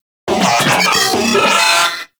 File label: Vocal Enemy Spotted; Warframe; Moa